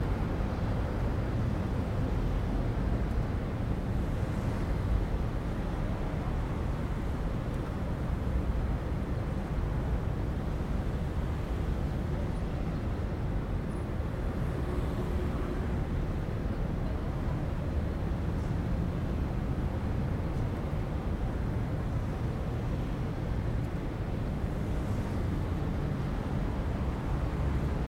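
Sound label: London exterior late evening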